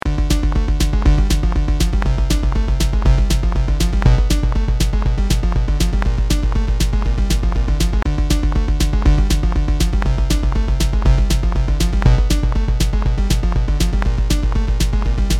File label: bongo